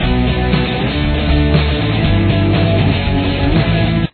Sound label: chorus main, both guitar parts with the bass guitar; drop D tuning